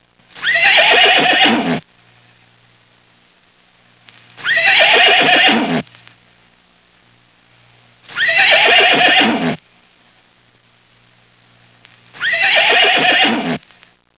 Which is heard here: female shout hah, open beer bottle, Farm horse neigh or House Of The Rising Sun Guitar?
Farm horse neigh